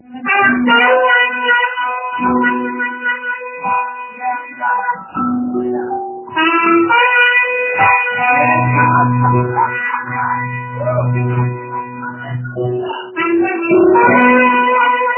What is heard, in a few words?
Blowing Of The Shofar; Power Prayer Summit 2018